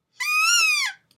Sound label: edison mazda whistle